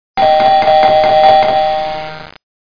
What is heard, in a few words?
DR BELL; FSSD SoundEdit Mac Sound